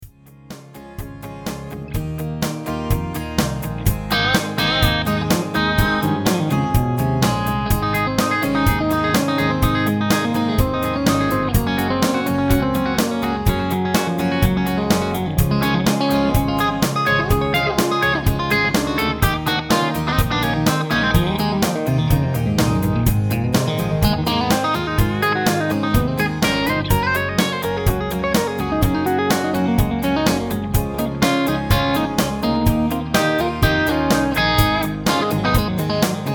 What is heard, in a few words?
country solo